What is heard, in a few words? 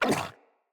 Kubrow Puppy Idle Fall; Vocals